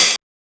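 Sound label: OHH, open hi-hat; X-Static Goldmine 1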